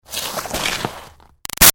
Snow Slide By Icy; Skiing and Snowboarding; Sports; BigRoomSound

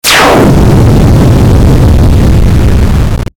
Descend Harsh Distortion Burst Crackle; Descending Sound Effects; Blastwave

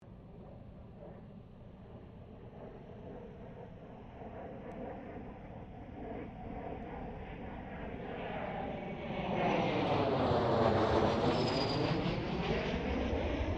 AIRCRAFT CA-27 SABRE PASS STEREO; F-86 Sabre; Airplanes; Vehicles

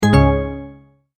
multimedia tone error; Tones; Sound Design

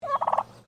Bird Turkey Domestic Call; Birds; Animals

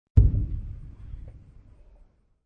Distant Artillery, Distant artillery blast; Distant Artillery 2; War

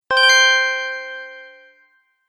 Right Answer, beep sound effects; object sound effects

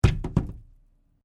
Cardboard box hit

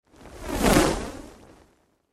Fireball Whoosh, Fire Whoosh Pass By Small; Flame Sound Effects; Sound Design

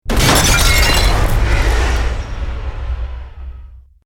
EXPLOSION MEDIUM BRICK WOOD GLASS NO FIRE

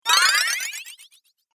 Cartoon Game Spell Speed, magic sound effects

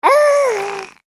Baby Happy Vocalization; human baby 3 months happy vocal; Voice; Babies; McKinney Sound

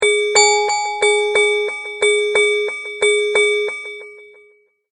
Multimedia Tone-Thinking/Processing; Sound Design; McKinney Sound

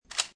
Load, Gun Handling; VRSonic; Guns

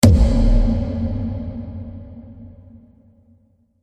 Cinematic Drum Strike Deep; Low Impact

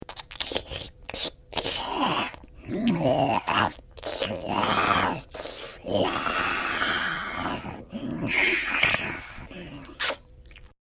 Ravenous Fiend Demon Eating; Monster Sound Effects; fantasy sound effect

